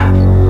bass F#1; 穿山甲

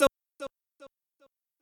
voice

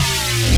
custom bass

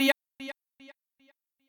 voice

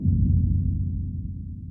heart

